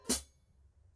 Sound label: zildjian